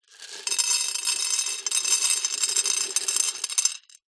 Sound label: pills tablets pour from plastic tub onto glass; household; zapsplat